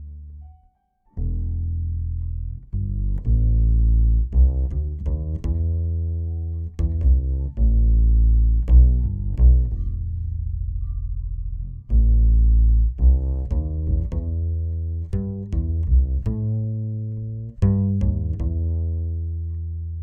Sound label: Jazz Bass original